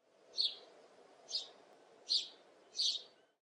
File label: chirp, wildlife; matmos hl